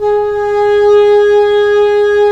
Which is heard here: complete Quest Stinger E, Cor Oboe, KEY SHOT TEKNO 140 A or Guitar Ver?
Cor Oboe